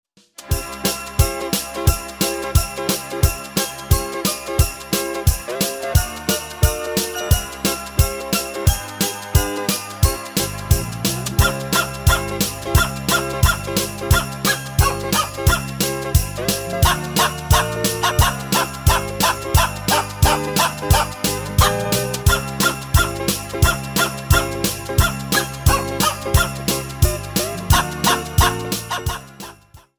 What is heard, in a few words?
Jingle Bells; Xmas Party Music